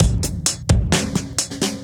Beat Style, 130bpm, breaks

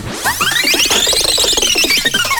FX; AMG Megabass REMIX! VOL-2

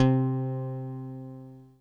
HYB SLAP C4; Greytsounds; Scott Peers Sound Engineering

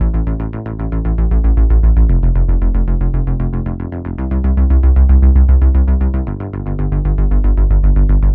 A Bass Modern Run, 115; Zero-G; Future Wave

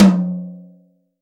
H-TOM 10, COATED TOM

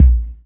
BD, Dance Mega Drums, BD HOU; Best Service

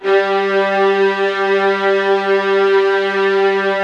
G3 SUS, LOUD VIOLINS; Q Up Arts; Denny Jaeger Master Violin Library

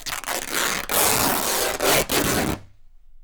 rip, Canvas Bag Rips; E-MU Producer Series Vol. 3 – Hollywood Sound Effects

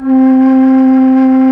NYE FLUTE; Nye Flutes

